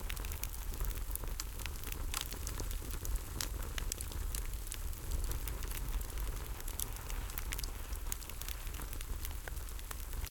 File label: surfer Island Fire